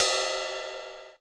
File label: Ride Acoustic Dark